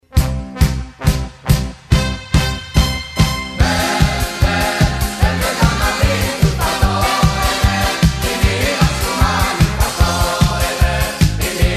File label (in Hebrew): גינגל